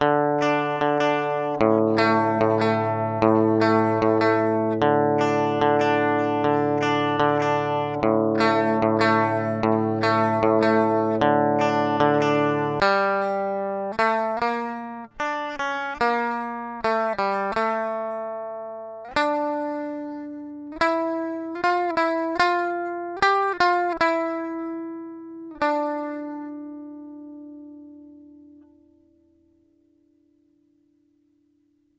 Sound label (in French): g1 rythm brut